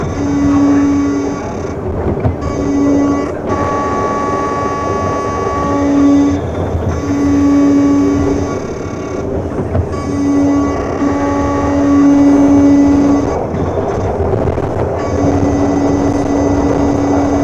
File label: pwm